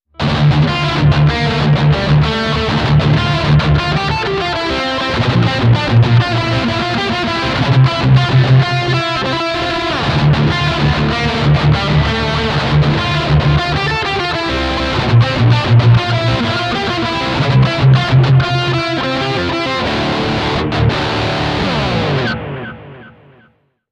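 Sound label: lead mode 2 lp delay 2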